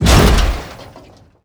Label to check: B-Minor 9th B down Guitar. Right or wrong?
Wrong